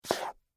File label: Pumps Scrape, Footsteps, Peep, Toe, Wood, Surface; BlastWave